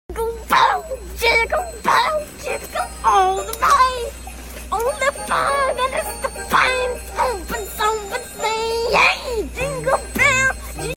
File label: jingle bells Meme sound